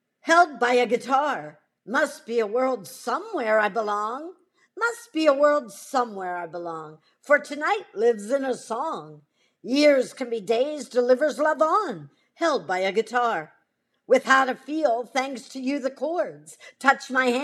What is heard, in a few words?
guitar